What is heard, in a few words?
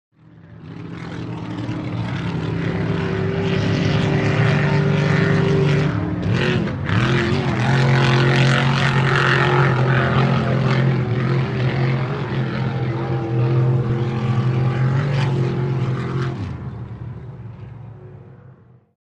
Power Boats By Fast, off shore power boat